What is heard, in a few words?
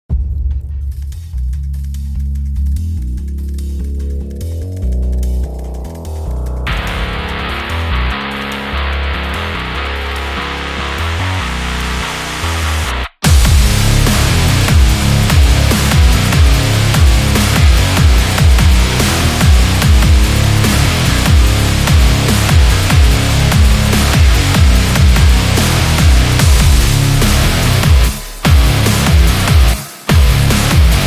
Dubstep Rock Metal